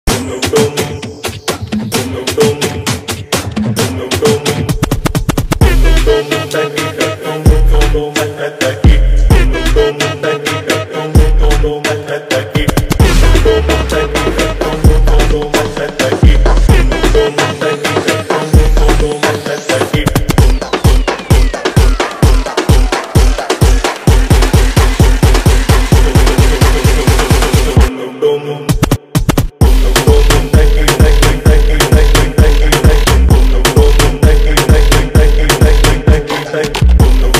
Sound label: club music